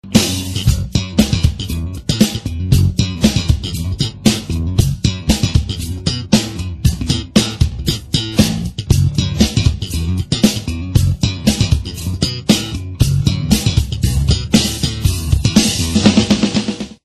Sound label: bass